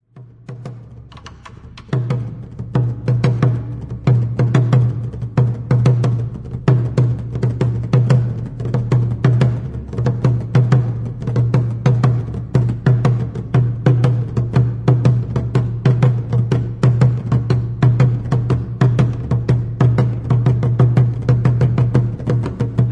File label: heartbeat live, taiko tabla